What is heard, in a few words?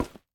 Footstep Battery Plate